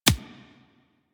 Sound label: Bullet Impact Hit Notification; Warframe; Weapons